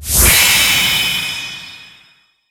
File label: big win text; netent